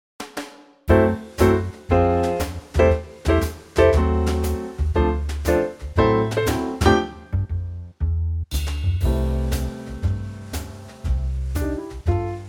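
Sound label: theme, backing track; key - C - twice through; Hugely popular theme from a film and TV series in Denmark in the 70's and 80's.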